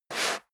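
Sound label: step leather shoes sand slide short, footsteps; schritte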